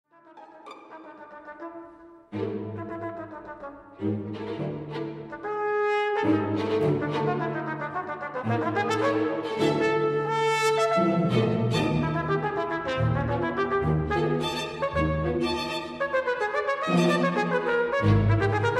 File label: Trumpet and String Orchestra